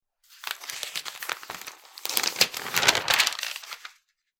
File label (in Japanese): 新聞紙をめくる音